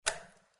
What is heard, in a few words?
Lights On; UltimateFishing